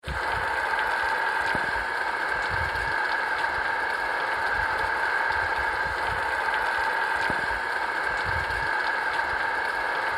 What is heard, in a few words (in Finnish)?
Kaasun ääni